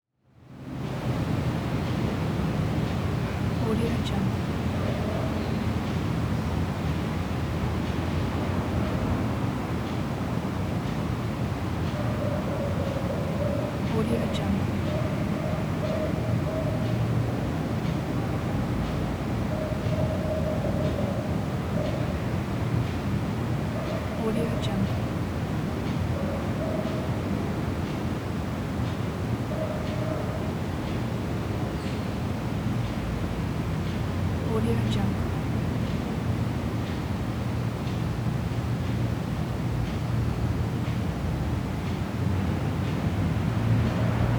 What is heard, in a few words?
roomtone living room afternoon suburban ambience